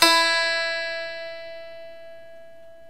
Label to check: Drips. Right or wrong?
Wrong